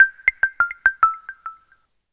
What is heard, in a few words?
Nokia Ringtone